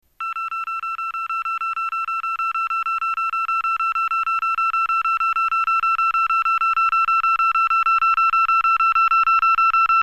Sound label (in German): ton, Signalgeraete